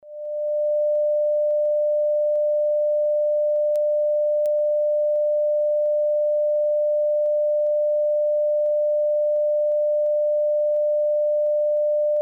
Orange Frequency; Sacral Chakra

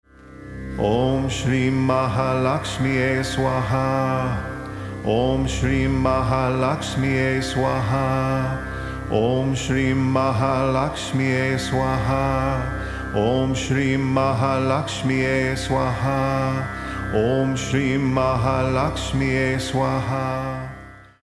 Om Shri Maha Lakshmiyea, Mantras

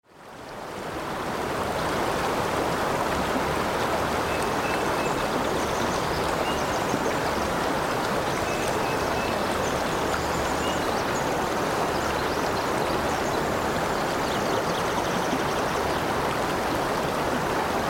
Big River with Thunder

